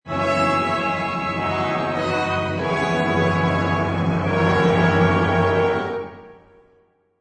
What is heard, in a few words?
Orch Finale